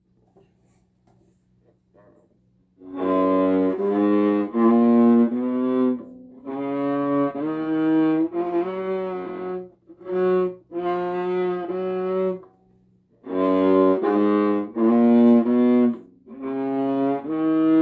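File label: F2 Major Scale badly, violin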